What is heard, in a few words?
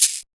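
150 UK shaker, Ragga & Dub Reggae; USB Soundscan vol.27; AKAI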